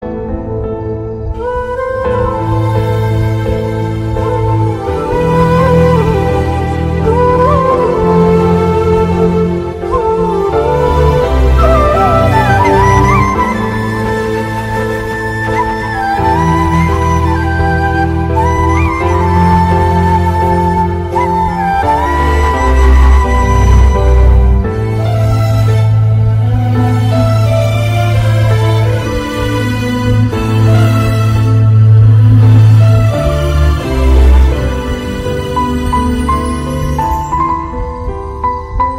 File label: heart breaking love music bgm